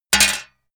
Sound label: metal plate thin drop